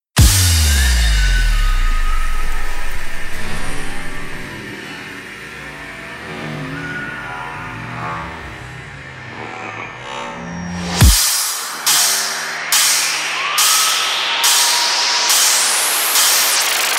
buildup; TF2Maps; chillskies; wubwubwub

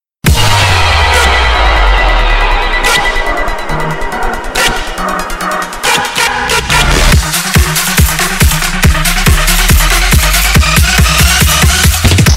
buildup; TF2Maps; chillskies; wubwubwub

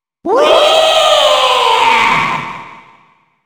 wheee; TF2Maps; wario

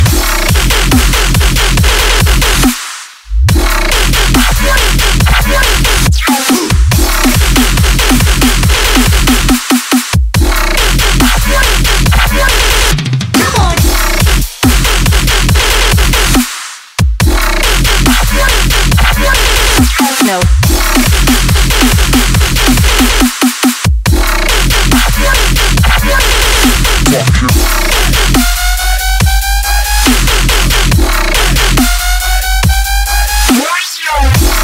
Drop, wub wub wub; TF2Maps

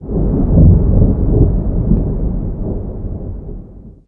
thunder; minecraft; ambient; weather

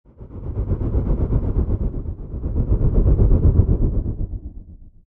hallow, ambient

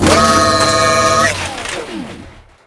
sentry finish; weapons

